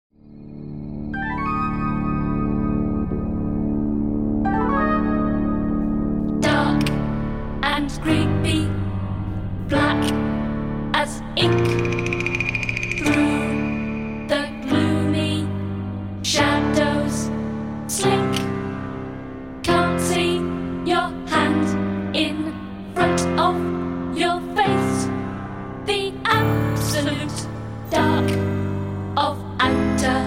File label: dark and creepy; out of this world